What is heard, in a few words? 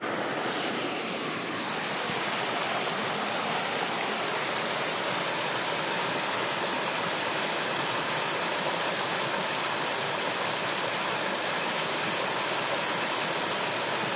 running creek